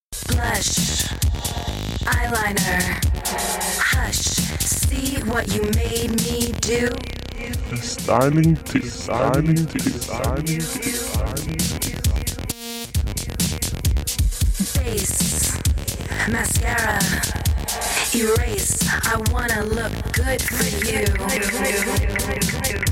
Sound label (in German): Jingle; Rovermagazin Stylingtip